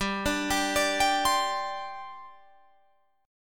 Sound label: G Suspended 4th, Guitar, Drop D